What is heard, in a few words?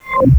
laser